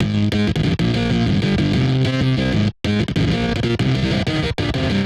Phase Plant, bass

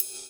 Ride; Equipped Music; Loungin House

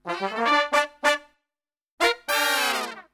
POP GM, Brass; Best Service; Brass Super Section; POP LICK

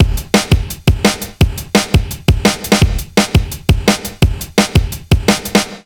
170 BREAK, Explosive Jungle

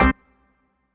HOUSE, House Dance

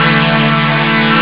Sus 2; jazz jackrabbit 1; M8

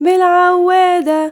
YOUNGIRL, Arabian Traditions; USB Soundscan vol.43; AKAI

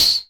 CREAK, Anime Sound FX; COMIC

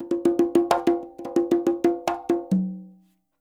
SF LOOPS 92, NYC Percussionworks; Sampleheads

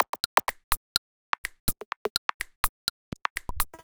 Minimal, 125 BPM, Percussion; Sonic Foundry (Sony Creative Software); Underground UK House & Electro

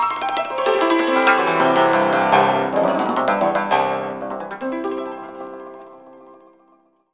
ZIMB HIT; Best Service; World Colours

